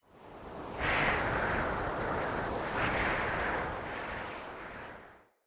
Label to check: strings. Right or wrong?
Wrong